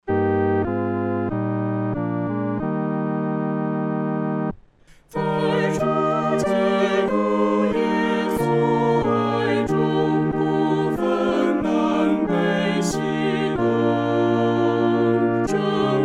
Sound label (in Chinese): 合唱 四声部